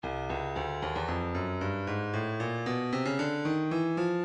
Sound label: breakbeat 114 bpm; Royal A; Stylus Breakbeat Midi Files